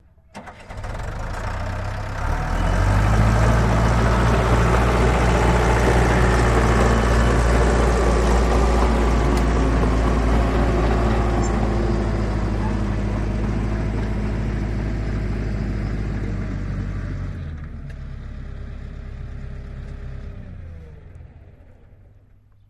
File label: Bulldozer Pass By